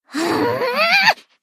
grrrrrr

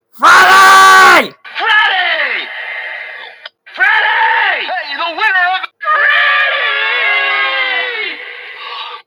montage of pepole screaming

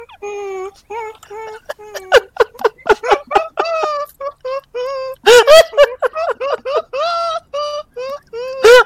hahahahahaha

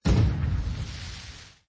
blast

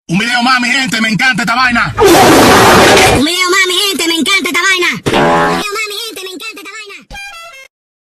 ohio ringtone ahh

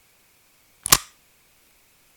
ios 5 unlock sound

